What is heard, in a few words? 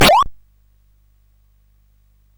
sid CSG8580R5